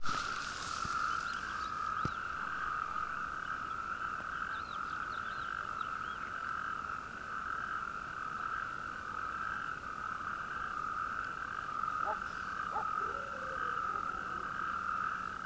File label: Frogs In A Pond